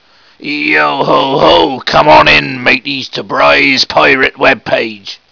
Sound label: yo ho ho